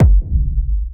Kick

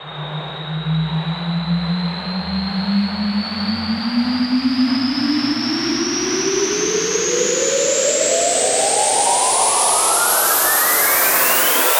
Riser 160 BPM 8 Bars

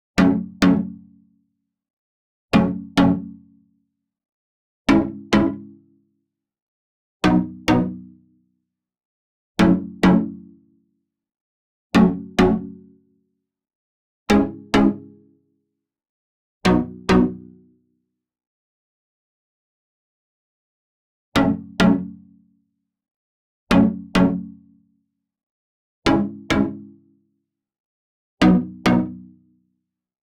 Pluck F-m 102 Dry

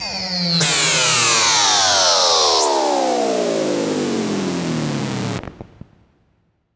FX Loop Fm 123

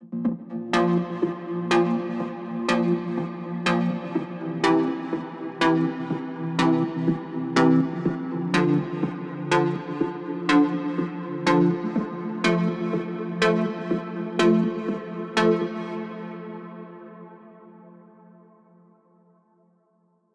Rhythm Seq 123 F maj Tail

